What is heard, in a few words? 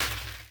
lab inventory pickup; factorio 2.0.55